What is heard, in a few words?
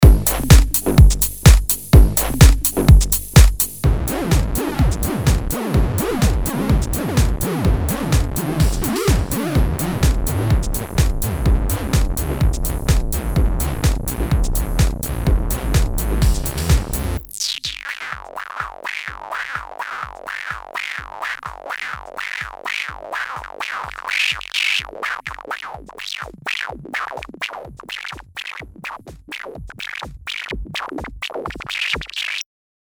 Track OSC Track Pulses